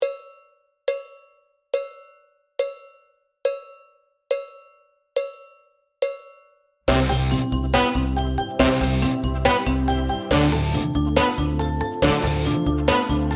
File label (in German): Paradiddle Uebung Click 70 bpm